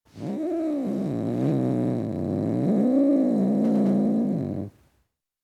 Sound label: Tom Cat Growling, Individual Grow; Cats; BBC Sound Effects Library